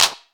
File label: studio clap single hit f key; single hits one shots